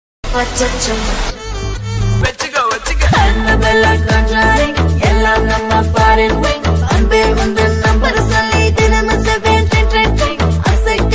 neon music ringtone; aranmanai 4; tamannaah; raashii khanna

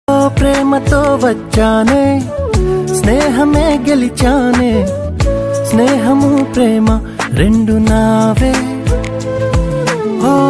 telugu love songs; manam

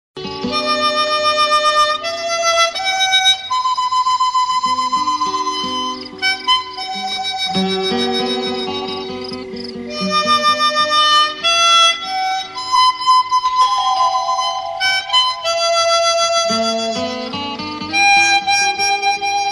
theme ringtone old ringtone; Sholay

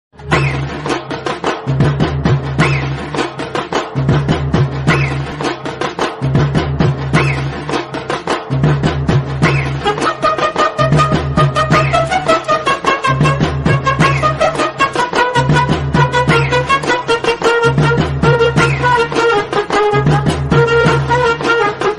mass bgm ringtone; komban bgm

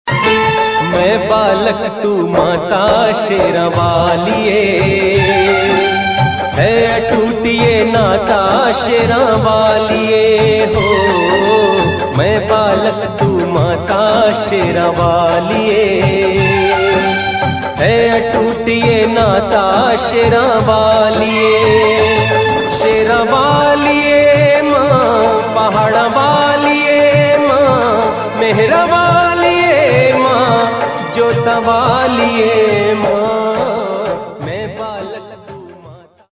bhakti hindi ringtone

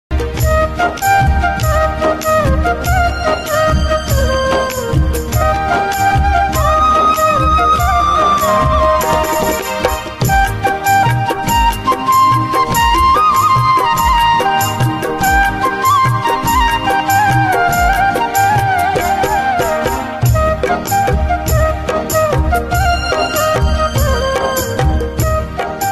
flute music

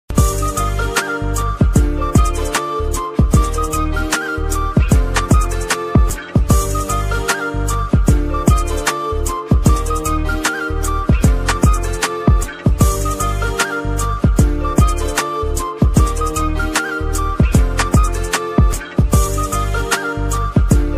instrumental ringtone panjabi song

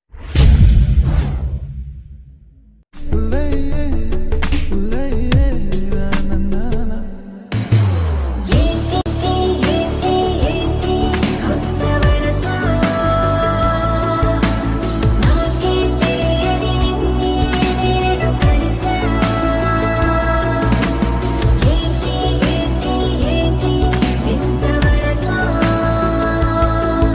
song bgm ringtone; geetha govindam

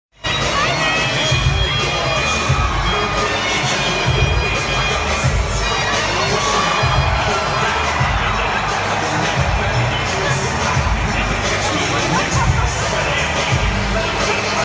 singing theme song; paul heyman

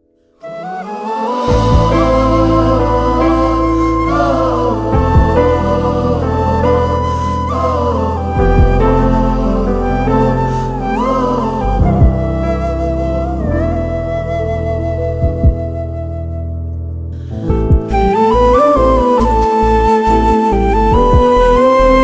emotional bgm; oh my kadavule

